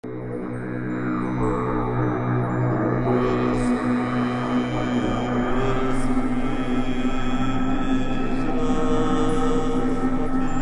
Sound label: mantra